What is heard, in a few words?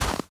walk snow